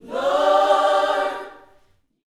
YOUTH CH PHRASE, Vocal Planet Gospel

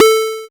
TECHNO; E-Lab; X Static Goldmine 1

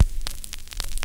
CRACK, CRACKL FX; E-Lab; X Static Goldmine 2; akai

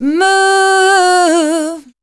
voice spectral, ly short

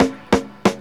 DRMROLL; E-Lab; X Static Goldmine 2; akai

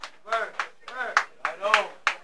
CROWD FX; E-Lab; X Static Goldmine 2; akai

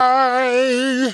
VOX

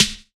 SNARE; E-Lab; X Static Goldmine 2; akai

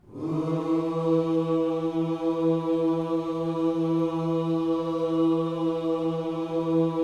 WHOO E 3, Vocal Planet Gospel; Spectrasonics; Vocal Planet Vol.1 Gospel; akai

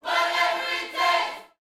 Vocal Gospel PHRASE

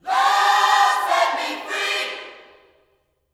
Vocal Gospel MULTI PHRS; LUV FREE; Spectrasonics; Vocal Planet Vol.1 Gospel

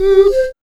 FLUTE; E-Lab; X Static Goldmine 1

